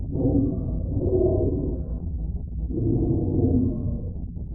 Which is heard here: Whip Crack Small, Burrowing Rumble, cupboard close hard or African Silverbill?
Burrowing Rumble